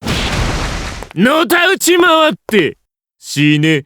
shine; terumi

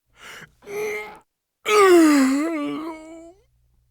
yawn; nago

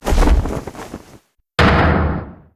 uncloak drama pound

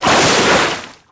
appear; orla

